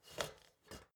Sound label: step ladder metal scrape single